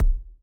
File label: Ball Player Hand Hit Tackleball Light